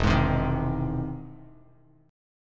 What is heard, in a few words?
E Augmented Major 7th E down Bass Standard; E+M7 strummed; JGuitar